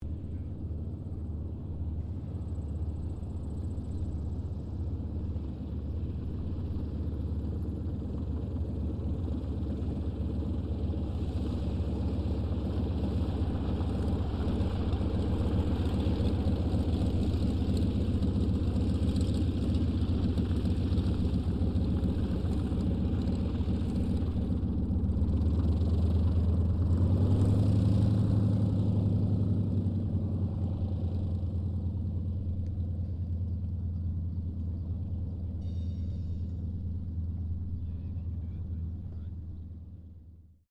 AIRCRAFT YAKOVLEV YAK-52 TAXI, Russian Yak 52 Training aircraft; Airplane; Vehicles